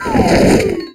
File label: fade out; Spanish